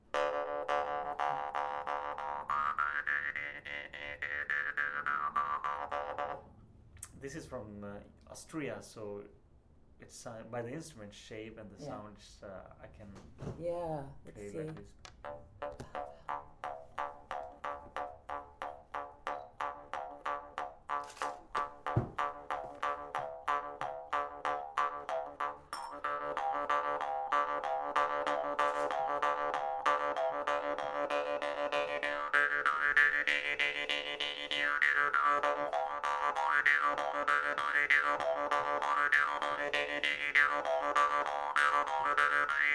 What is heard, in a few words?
playing jawharp